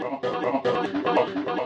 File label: voxuguy, vocal or voxy loops